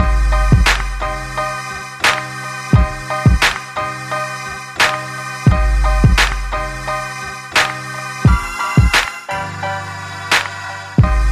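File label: hip hop instrumental 96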